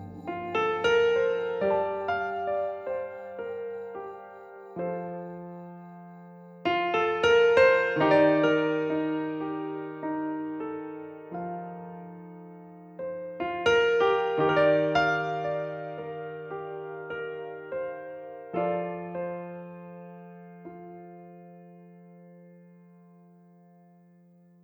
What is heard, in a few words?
Piano; BB 2HTC Samples Vol 4; Solos